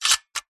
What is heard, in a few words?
warfare gun mechanism clicks